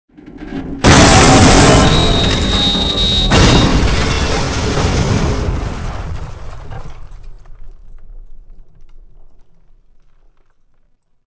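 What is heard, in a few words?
elevator; Soldier Of Fortune II Double Helix; XBOX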